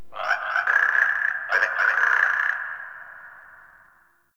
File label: Swamp Croak Distant; Ambience; Midrath Island